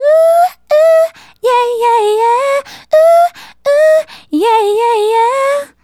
Female Solo, Vocal

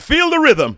VOX SHORTS; Megabass Remix! 2

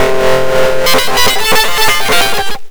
DISTLOOP, Big Beat Samples

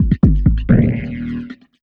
Bumpers; Sonic Foundry (Sony Creative Software); Esoterik Beatz; Messup Fx

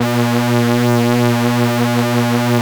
3100 A#3, Korg PS3100; Back In Time Records; Korg Universe VOL-1

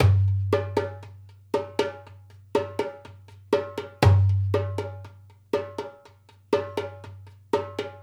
Dumbek; Essential Percussion

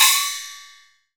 CHINESE PRC, PERCUSSION

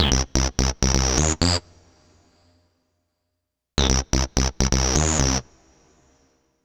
Android Riff, Funk; Dr. Fink's Funk Factory

